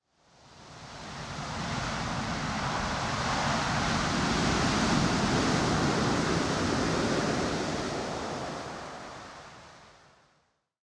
ambience ocean big wave; smite; conquest

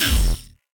debuff; Smite; god Janus

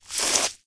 victory beard comb; smite; odin

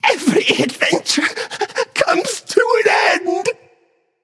death; smite; agni

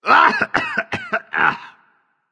clear throat; smite; voicepack; sobek

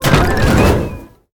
Activate; Smite; god Izanami

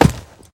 horse hoof; smite; guanyu

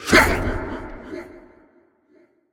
grunt attack; smite; sylvanus

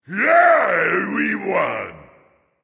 nv yay; Smite; ymir skin9

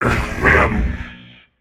clear throat, voicepack; smite; jorm

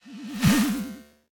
Landing Bee Flyby; smite; god cupid skin13

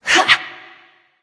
grunt jump; smite; serqet

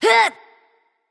grunt jump; daji

